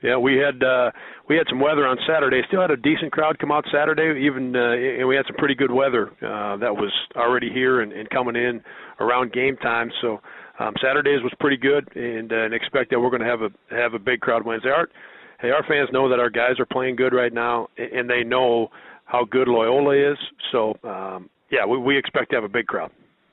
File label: crowd